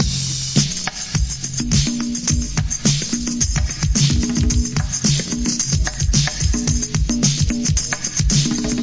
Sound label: big classic congabeat, 109 bpm, drum loop; 101 to 110 bpm